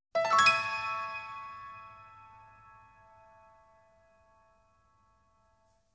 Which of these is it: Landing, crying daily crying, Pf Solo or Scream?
Pf Solo